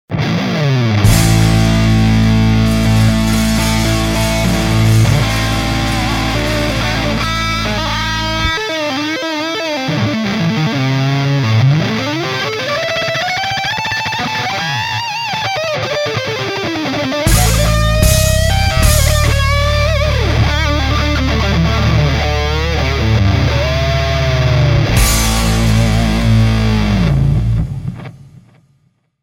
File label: Lead